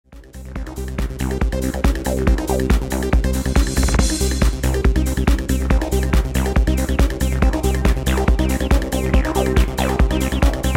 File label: Into Trance